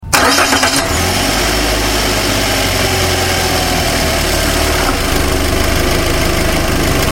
Alza Ignition Outside

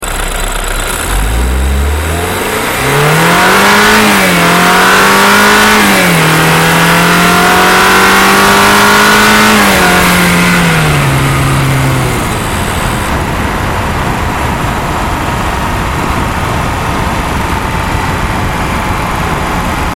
328i Hard Acceleration Eco Pro mode Outside

